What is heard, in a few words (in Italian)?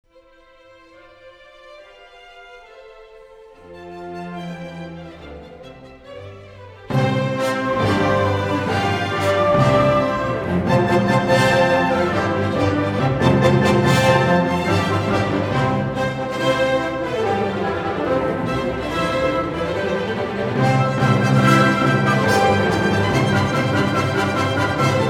molto allegro